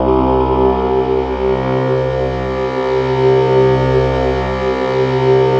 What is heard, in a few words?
PAD; PADS 2